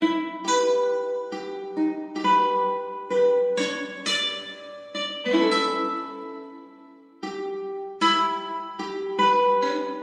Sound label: Bard Lute Periodic; Russell Brower; originally from Legion; World of Warcraft; Battle for Azeroth